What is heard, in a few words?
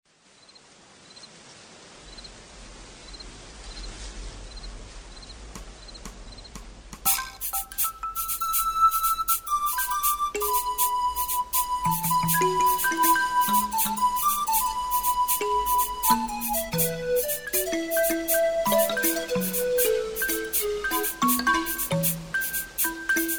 Grassland Winds